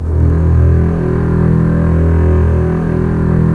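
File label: STR CBS, Strings; Roland - LA Composer vol. 4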